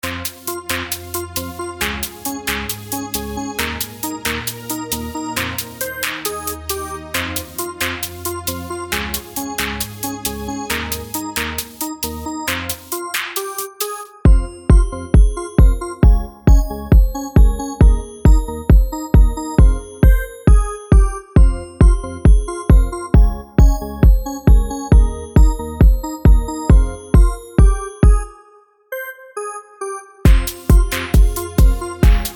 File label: hiphop 135BPM